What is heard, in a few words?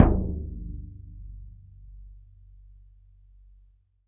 BODHRAN, Ireland; E-MU Producer Series Vol. 7 – Old World Instruments